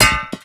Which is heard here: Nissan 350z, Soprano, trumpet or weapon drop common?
weapon drop common